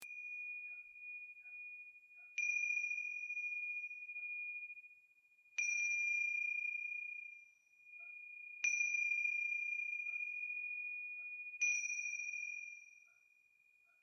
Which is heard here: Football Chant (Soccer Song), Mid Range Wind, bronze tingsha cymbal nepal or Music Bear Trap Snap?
bronze tingsha cymbal nepal